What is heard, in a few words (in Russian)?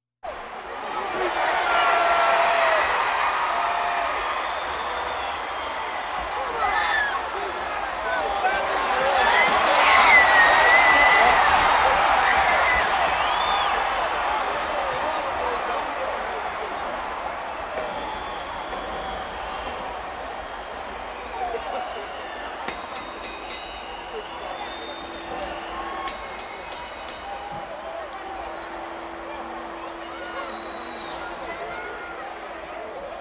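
реакция толпы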